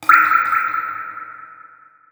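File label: Dungeon Recover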